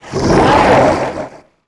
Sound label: Unbound Elemental Attack